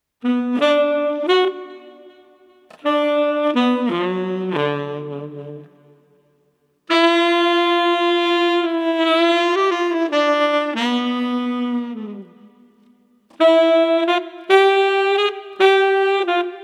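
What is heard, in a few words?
Street Tenor Sax Hangover, 110 bpm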